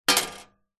foley money coin drop into empty metal collection drawer